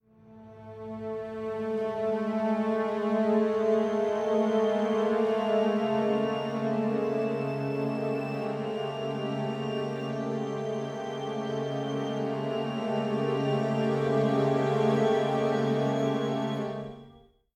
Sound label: String; The Bureau XCOM Declassified